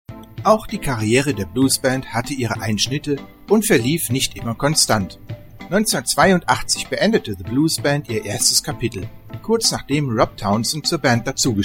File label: Blues Band